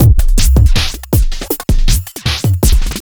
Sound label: JUNGL 160, JUNGLE LOOP